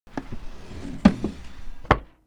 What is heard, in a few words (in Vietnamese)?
tieng day ngan keo tu ban lam viec